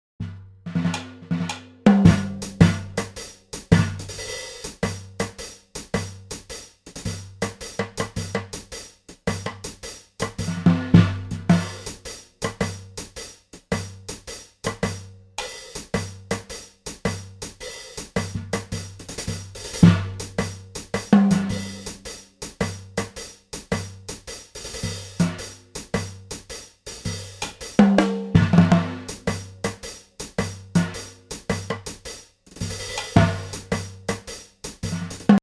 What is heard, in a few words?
Cocktail Reggae